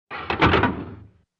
Heavy electric breaker switch, large handle type.; SWITCHES AND LEVERS SWITCHES

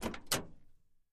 Camaro Door Open; chevy camaro

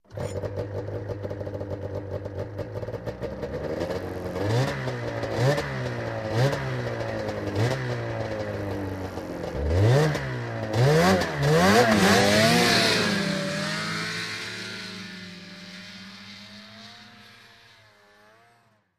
Snowmobile; Start, Rev, & Away

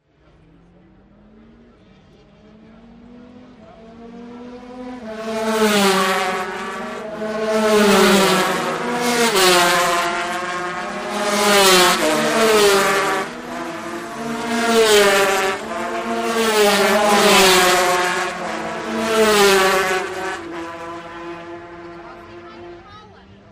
Motorcycle Prac Amb, road racing

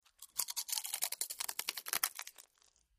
Slower Bite Chips

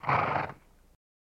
Horse Blow Generic

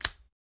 Light Switch Lamp; switches

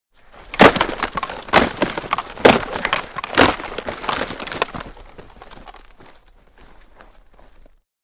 Football Block Multi

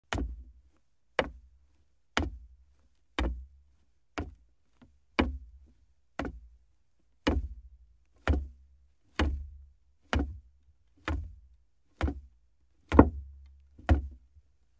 Footsteps Hollow Woo

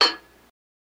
Glass Clink Clunky En

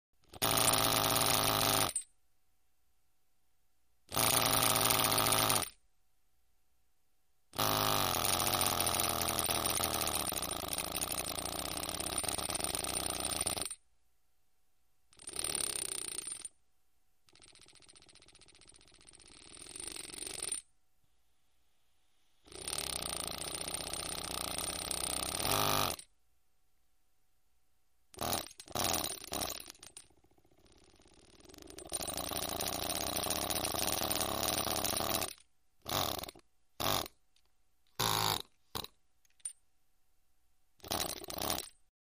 Small Pneumatic Rive, rivet gun

